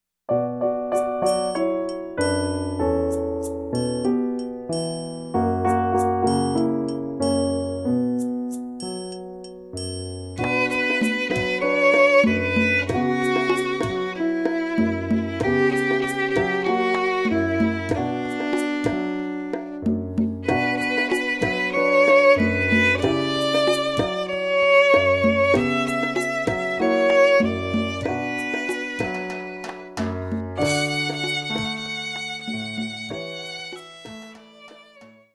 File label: Cuban blues